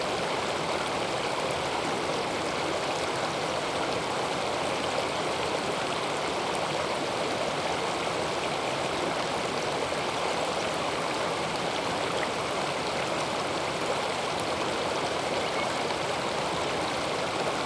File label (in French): Ruisseau Haut Plateau Binaural; LESCUN; Geoffroy CANTOU